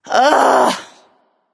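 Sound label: ugh, female; smite; voicepack; hera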